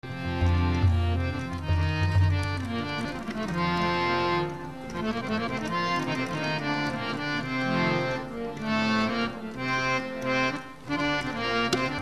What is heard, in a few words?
instrument harmonium